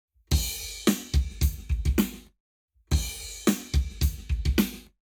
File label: Lab Wet Drums 0 to 360deg; eMagLS